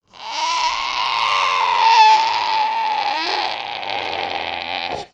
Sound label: Monster Bird Creaking